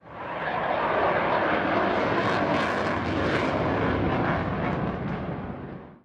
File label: eurofighter